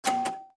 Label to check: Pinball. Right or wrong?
Right